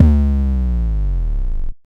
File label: slide 808